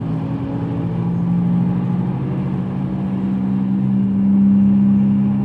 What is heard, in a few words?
Bad Wheel Bearing Sound; Cadillac XLR